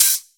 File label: Open Hihat; Mutekki Media; Vengeance Electroshock Vol.2; VES2 Cymbals